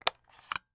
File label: Technology Electronic Remote Control Removing Battery Cover